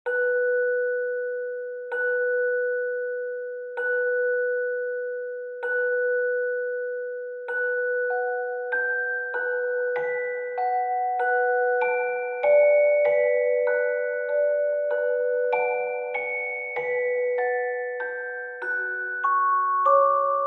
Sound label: Music Box Nostalgia